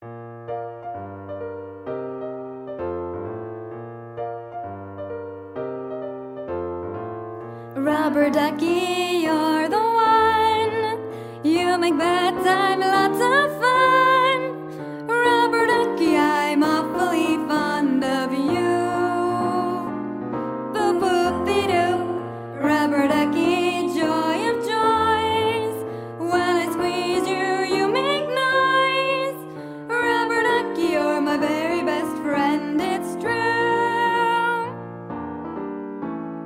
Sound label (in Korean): 영어여 동요; Sesame Street